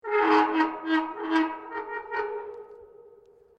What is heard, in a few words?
METAL CREAK LARGE; Materials